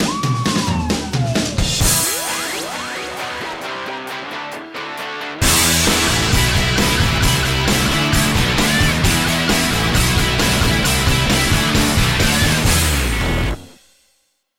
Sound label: stock music